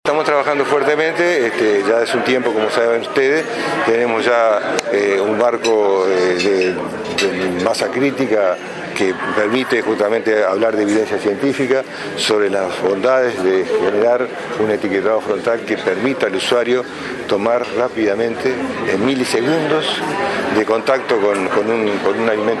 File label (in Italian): basso